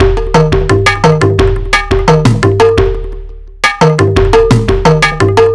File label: Mixed Percussion, percussion loops; KJ Sawka; Drum n Bass & Beyond; Drum Tool Box